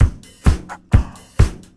BEAT; USB Soundscan vol.32; Roots Disco; AKAI

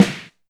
Snare, Alesis DM5

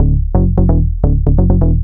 BAS, 2 Step

